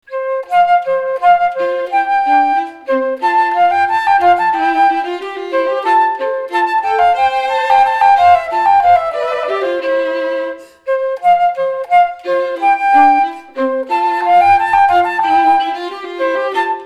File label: flute